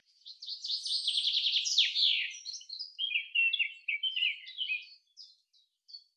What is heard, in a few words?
Buchfink Songbird Chaffinch Great Tit